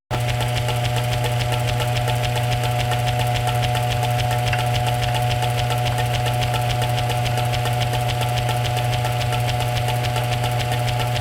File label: Walking Machinery Trucks